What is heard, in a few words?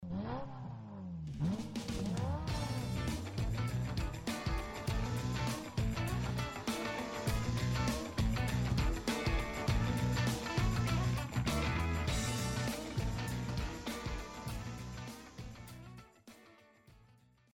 car Music